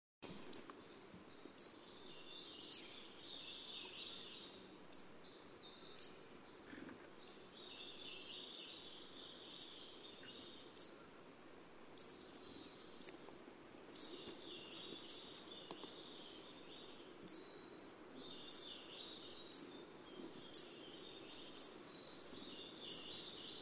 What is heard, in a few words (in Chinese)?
酒紅朱雀; 雀科/留鳥